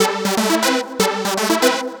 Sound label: Trance Synth